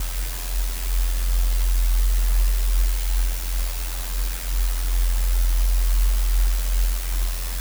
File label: Drone Ambient; Drums & Drones